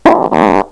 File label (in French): canard, prout